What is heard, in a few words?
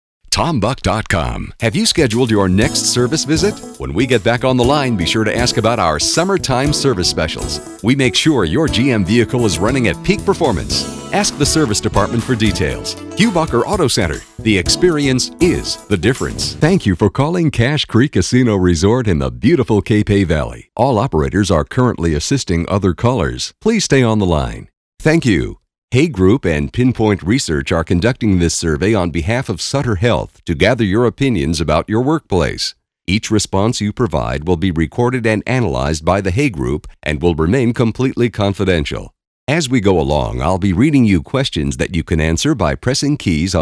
on hold